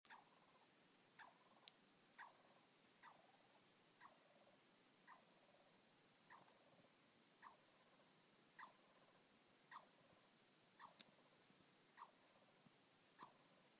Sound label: Nighttime in Australia